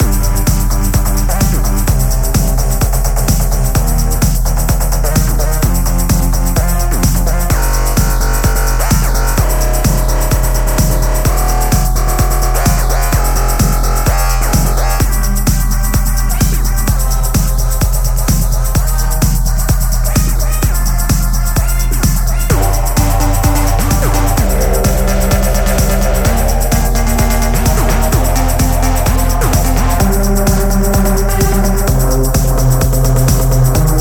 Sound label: riffs